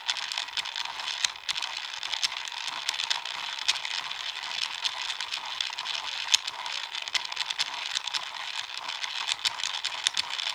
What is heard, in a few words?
Scrape Plastic Crank